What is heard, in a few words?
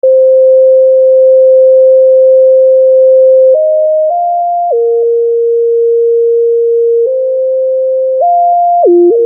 Keyboard, Soul